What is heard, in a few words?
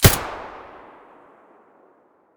aps muzzle mono; STALKER; IWP